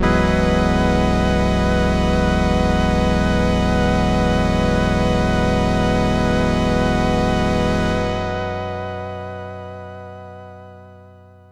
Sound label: Synth, Sword Fizh Splendor